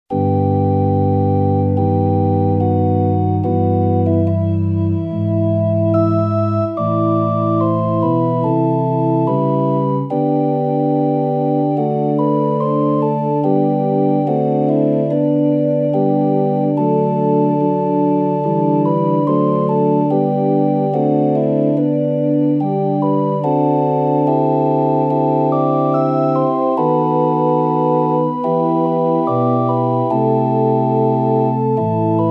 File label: panis angelicus organ; franck